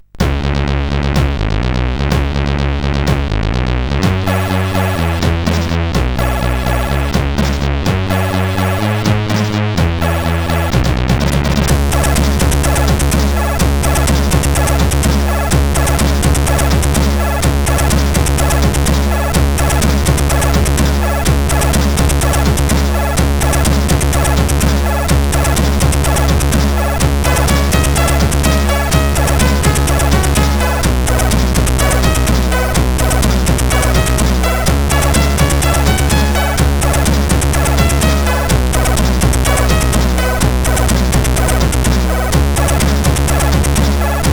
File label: Daybreak, CSG8580R5; sid